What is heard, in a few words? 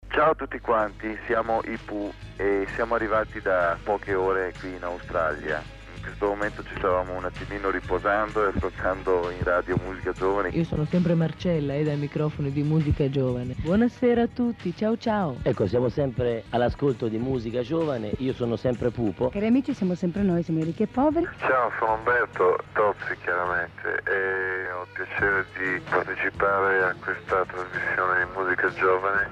Singers Promo